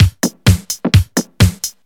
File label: Housebeats, 128BPM; Vengeance Samples; VEH2 Loops